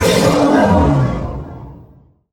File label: injured